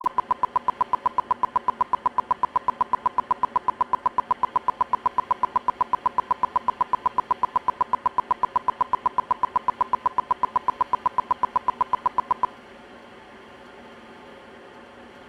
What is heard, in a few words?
burst 16ms 1kHz; LaSpezia; 30V; S1 Mic2 guida; CESI